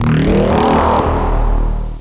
exclamation; quake 2

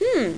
hmm; Tetris Collection for Windows 98

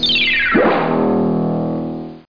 BUTTON

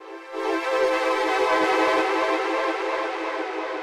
SYNTH PAD HOUSE 125 A; eJay; Sphere

